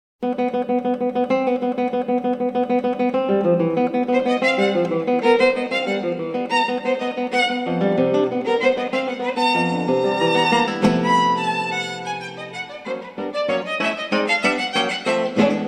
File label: Two Pieces for Guitar and Violin, Scherzo